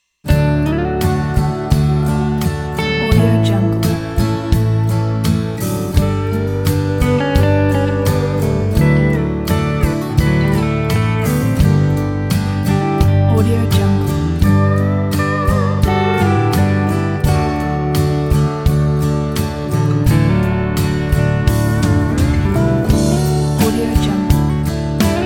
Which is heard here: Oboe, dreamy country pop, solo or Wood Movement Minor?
dreamy country pop